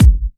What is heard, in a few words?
Bassdrums